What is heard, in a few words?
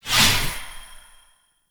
Hand Projectile; Duviri; Lotus Hand; Weapons